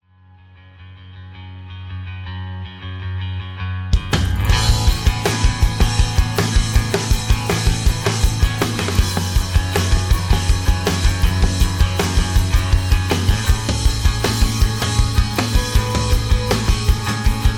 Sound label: kick